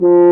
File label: BRS F HRN, French Horn Sh; Roland L-CDX-03 Disk 2; F.Horn 4 Sh